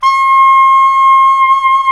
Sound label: SAX SOP MF, Soprano Long